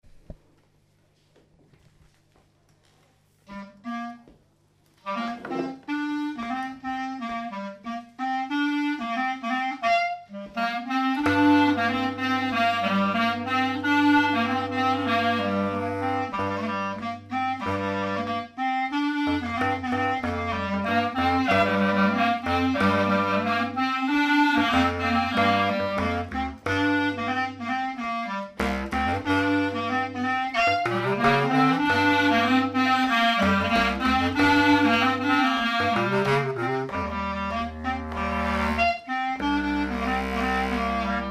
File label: tours suite, clarinette